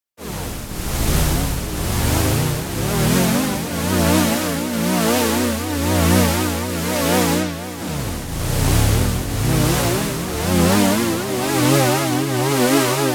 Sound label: fx dione